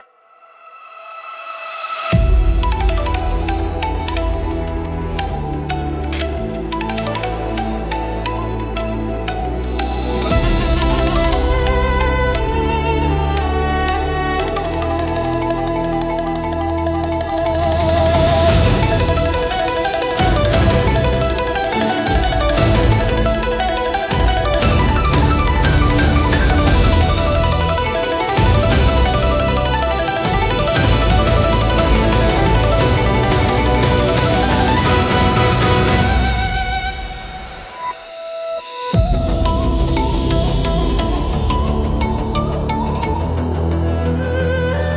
Thunder Road Ronin, cinematic japanese style battles